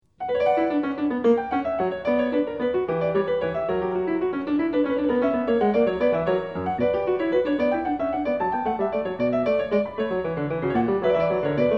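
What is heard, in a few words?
courante, piano; French Suite 4; BACH; FRENCH SUITES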